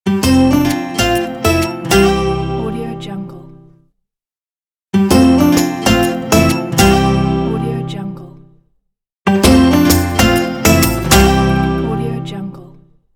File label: positive acoustic logo; beathebeat